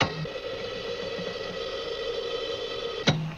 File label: Respirator; Hospital